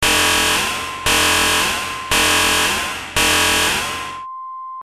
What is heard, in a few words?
alarm